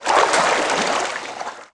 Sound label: Swim; Player; Footsteps